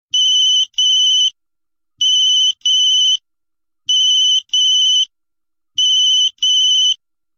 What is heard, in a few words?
ring ring ericsson